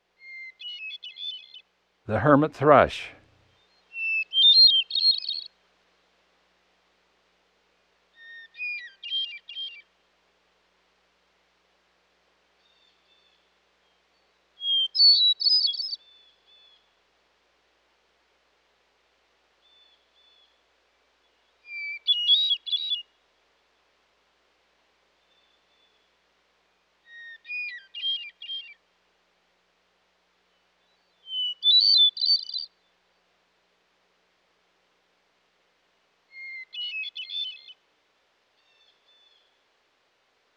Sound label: Hermit Thrush; Dan Gibson; Wildlife Identification By Sound